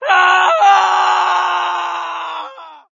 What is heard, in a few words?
fall scream